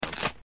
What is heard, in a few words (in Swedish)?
Knak